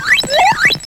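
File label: FX left, attacks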